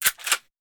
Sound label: desert eagle reload, magazine